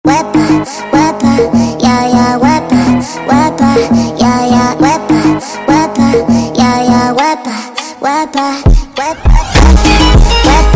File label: Funk Sped Up